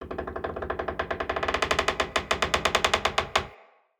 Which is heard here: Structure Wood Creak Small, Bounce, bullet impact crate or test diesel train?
Structure Wood Creak Small